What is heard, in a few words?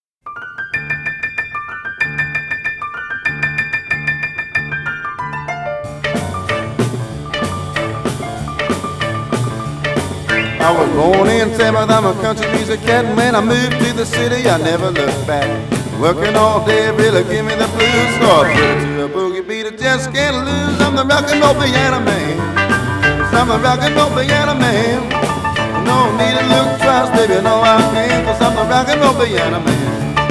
ROCK 'N' ROLL PIANO MAN, rockabilly